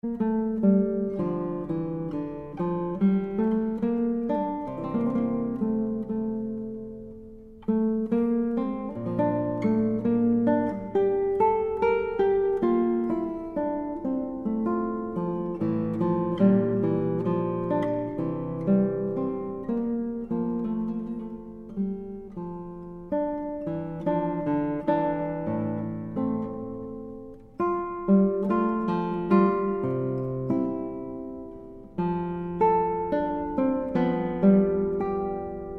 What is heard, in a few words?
Violin Sonata in C Major Largo (Arr. For Guitar)